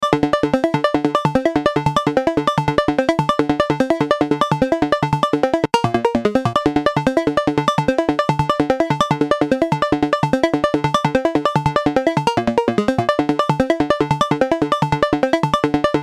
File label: Tierra Audio Gravity VCA seq